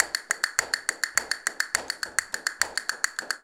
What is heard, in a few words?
CASTANETS, Flamenco